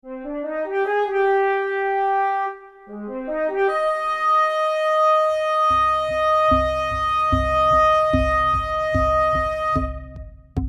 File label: flutes plus